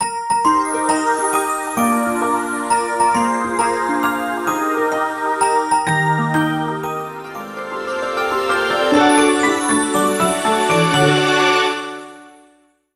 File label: Jingle Birthday